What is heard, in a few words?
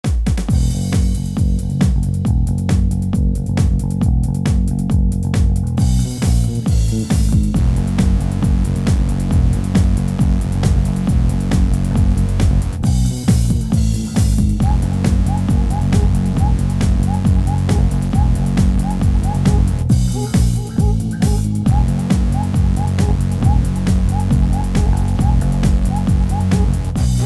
Bass Version